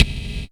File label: Gated Kit, Percussion; Best Service ProSamples vol.37; Dance Synths